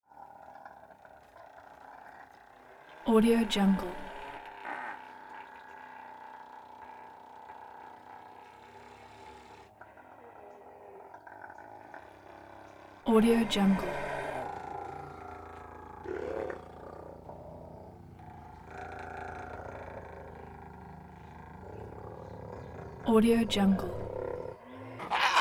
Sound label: hyena sustained constipated aahs laughs nasty